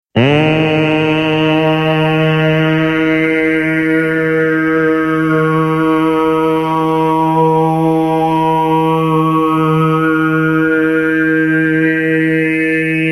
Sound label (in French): voix tibetaine